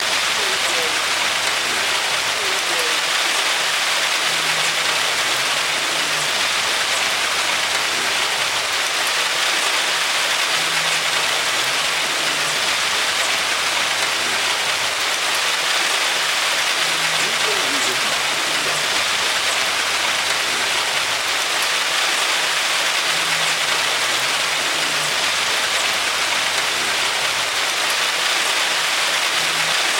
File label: High Rain